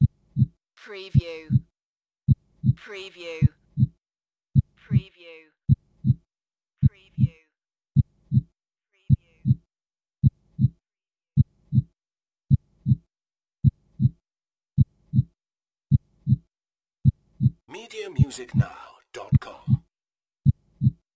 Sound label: Heartbeat several; lynne-publishing